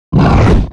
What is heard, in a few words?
VAFER REX ATTACK